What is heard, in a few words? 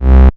Bass C, Offbeat Bass